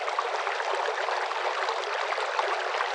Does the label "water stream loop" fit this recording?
Yes